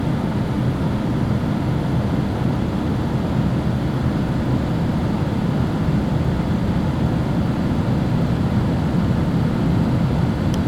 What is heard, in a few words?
Computer Fan